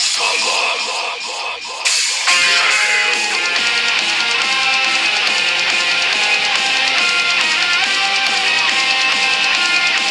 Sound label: samba metal